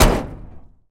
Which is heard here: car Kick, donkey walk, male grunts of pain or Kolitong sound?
car Kick